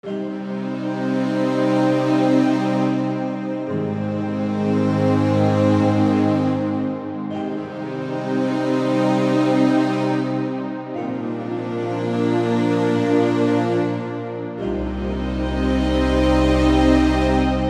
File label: strings intro